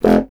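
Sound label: wind contrabassoon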